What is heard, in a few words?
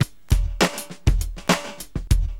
Drums, Acid Jazz Funk; LiveAct Pool